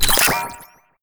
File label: camera fail